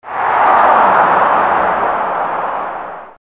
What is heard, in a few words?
Sound effect ovation